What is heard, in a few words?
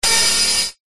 science fiction door slide auto open close